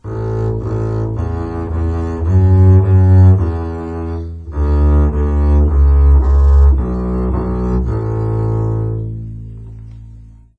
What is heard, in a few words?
bass twinkle